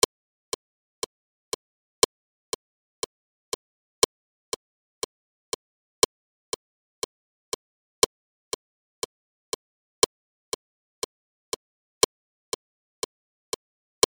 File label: Flute; Angels We Have Heard On High; Duets